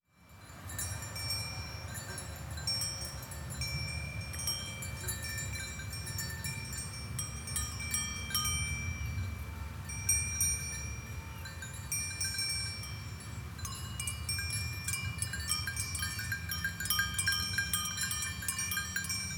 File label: Sheep Bells; ARC Romainmotier Switzerland